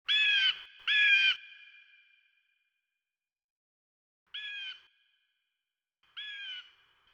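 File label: bird chirp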